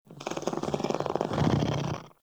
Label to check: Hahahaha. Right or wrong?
Wrong